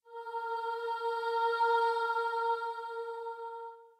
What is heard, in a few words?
starting note, voice; dramatically signing off